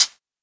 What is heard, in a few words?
Dampened Hi-Hat D#, foot cymbal tuned to the D# note. Loudest frequency: 6127Hz; hat samples